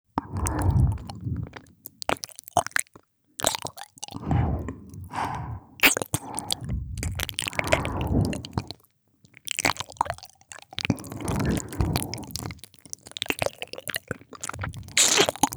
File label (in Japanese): 両耳 舐め; トウカの両耳舐め♪